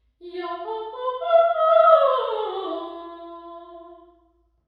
sing, dixon studio theatre; university york

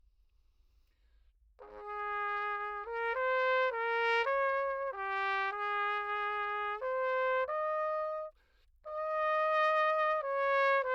piece, pocket preachers cornet, Anechoic; OPENAIR

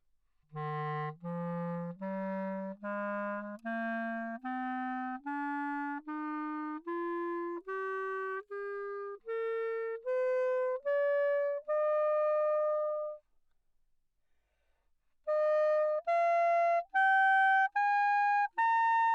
classical clarinet bb, Anechoic; OPENAIR

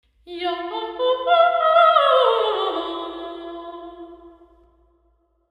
st albans sing, lady chapel; cathedral; omni

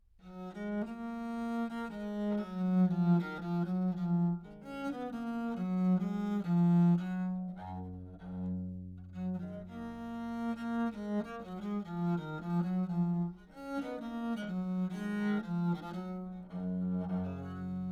piece, bass viol back mic, Anechoic; OPENAIR

